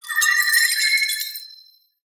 Mana Geode Attack

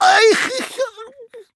Surly Dwarf Death

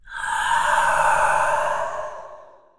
Spirit Death

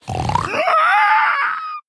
Female Quilboar Death

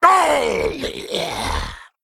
Male Worgen Death

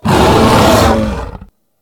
War Bear; Amani War Bear

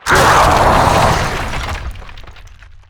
Ice Rager Death

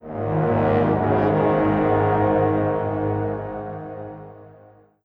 Marsh Play Stinger

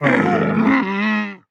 Spitting Camel Death

